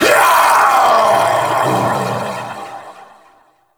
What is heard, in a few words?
Vocal Death; Warframe; Duviri; Dax